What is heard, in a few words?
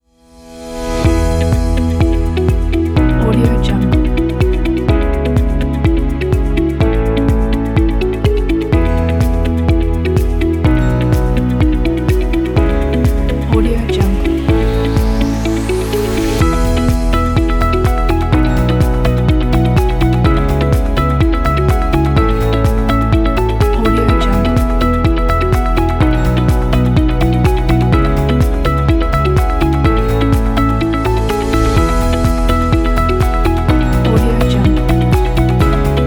the upbeat corporate